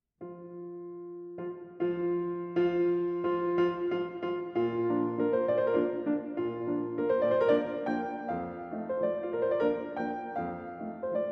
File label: Mazurka in B flat major; B.73; Wolowska